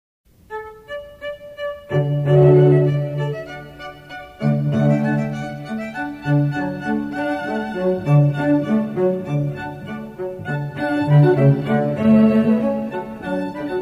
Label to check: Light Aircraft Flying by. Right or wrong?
Wrong